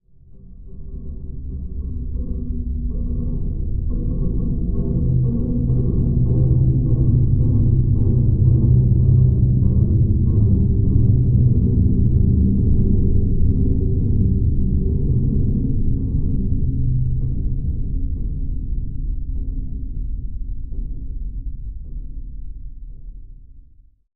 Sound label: Underwater Int Metal, metal groan atmospheres; Underwater Int Metal Rumble